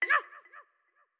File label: Skeleton Hurt